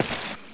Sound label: player slosh